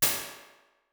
Ring Room; Convolution; Medium Rooms; IR Samples; Native Instruments; Battery 3

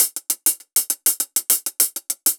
Hihat Dirty 100 bpm; Loops; Drums; Top; Doug; Ableton Live 12 Lite; Core Library

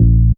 Bass Guitar; One Shots; Instrument

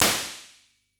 Plates Plate C, impulse responses; Ableton Live 12 Lite

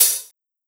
Hihat Open, drums; One Shots

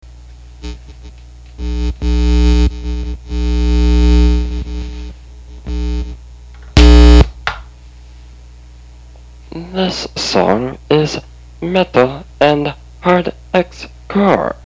Song Is Metal And Hard X Core

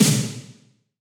Snare Verb H3500, drums

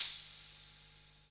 Tamb Ghost; Drums; Tambourine

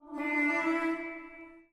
SFX SOLO mix; Journey

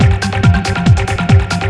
KALIMBA; Yume Nikki

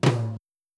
Tom; Ableton Live 12 Lite; Core Library; Drums

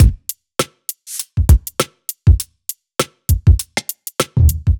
MPC 100 bpm, drums; Loops; My MPC Rocks; Ableton Live 12 Lite; Core Library

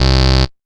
Galactica, C1, Synths Multisamples; Ableton Live 12 Lite; Core Library

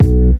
Bass Chop Happy B

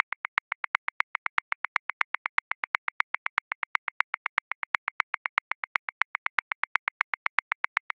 Dancehall Raggamuffin Accent 16ths; Grooves; Ableton Live 12 Lite; Core Library

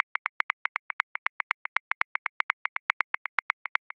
Jazz Accent 16ths; Grooves; Ableton Live 12 Lite; Core Library

